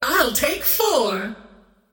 scream; queenofpain

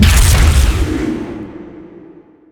spear impale; weapons; dota; mars

